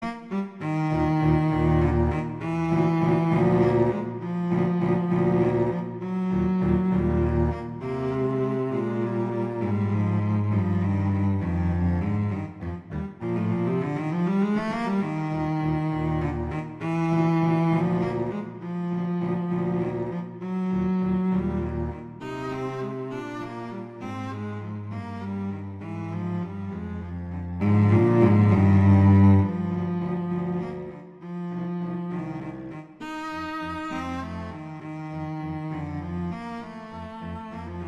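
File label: Duet, Andantino, viola; Mazas; Duets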